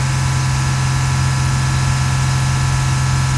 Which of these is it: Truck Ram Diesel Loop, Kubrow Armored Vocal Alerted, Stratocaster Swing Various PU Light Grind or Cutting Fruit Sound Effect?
Truck Ram Diesel Loop